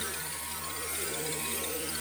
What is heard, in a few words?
float; lea; CrossCode